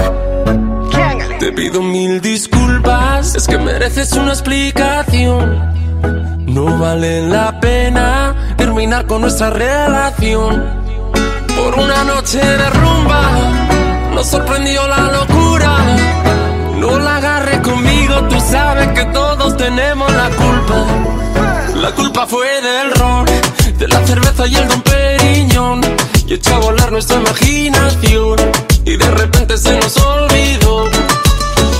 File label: alien music